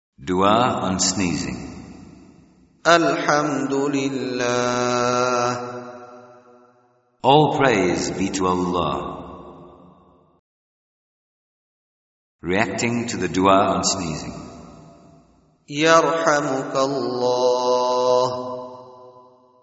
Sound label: Sneezing